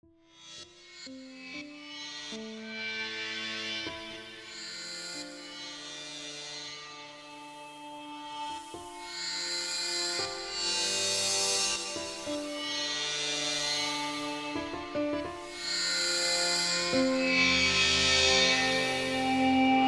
Horror Piano

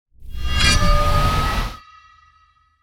Spears Launch; Imperius

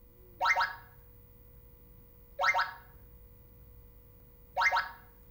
Electronic Bloops

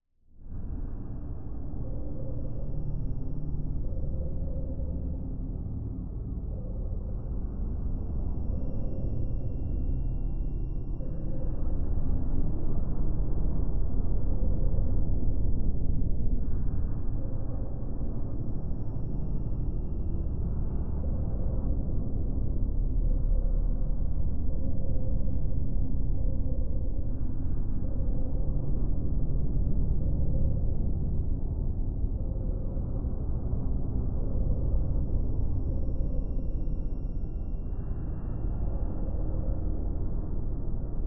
Desolate Wasteland Background

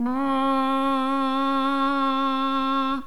Human trumpet C

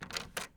Door Unlocking

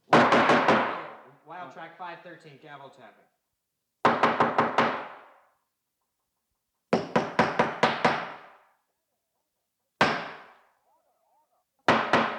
Gavel Tapping Hitting

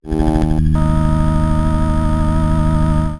Little Mad Dance C1

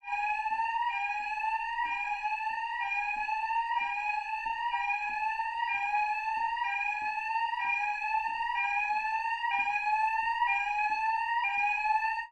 Fire Alarm, House; Summertown

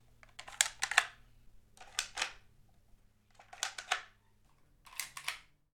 Loading A Gun

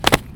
Two Step Landing

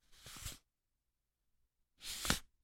Paper Shuffling

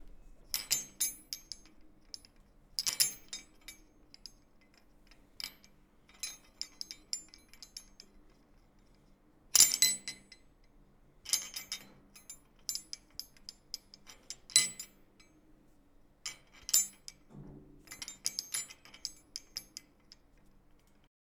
Door Hook

